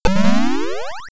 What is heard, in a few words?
missle; Curse of the Azure Bonds